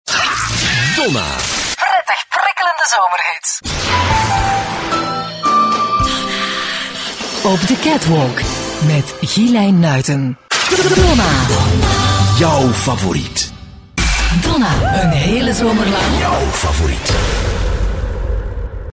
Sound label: jingles